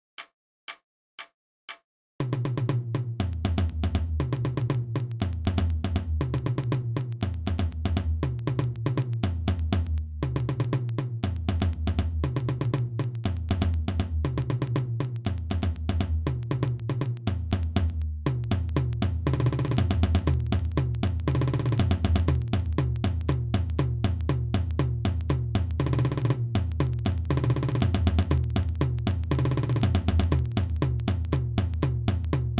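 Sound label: Tom Toms, 120 bpm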